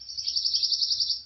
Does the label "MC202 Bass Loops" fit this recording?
No